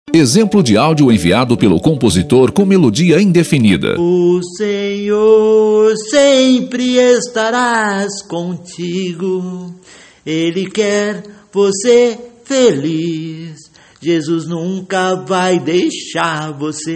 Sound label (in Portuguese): exemplo melodia indefinida